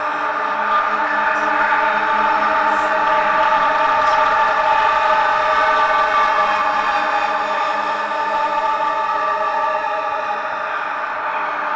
Evil Approach Loop; whispering willows; Martha

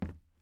plastic step

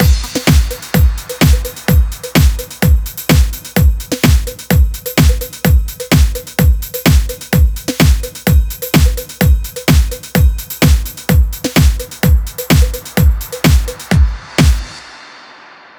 Funk Outro; Funklift

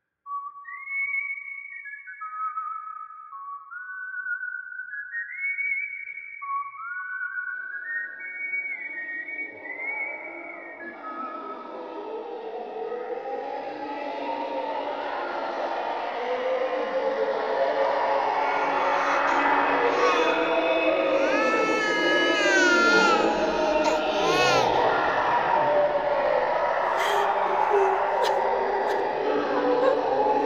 Voices Crying

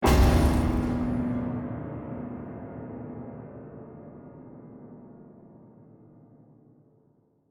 shock piano; Joe's Diner

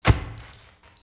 Prison Monster Walk; silent hill 2

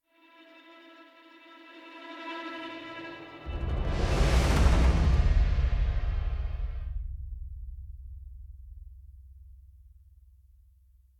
Action Get Ready 106.7bpm Intro

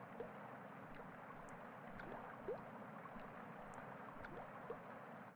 Mountain Goopy Terrain Gen Idle; Mountain SFX; Made in OHC

